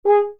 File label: G#4, mute